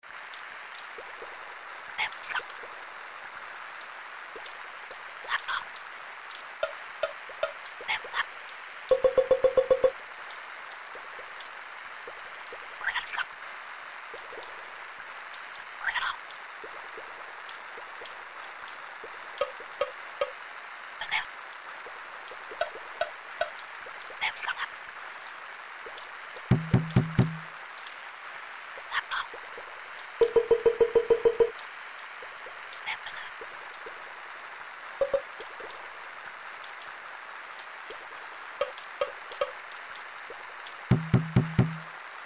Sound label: asmr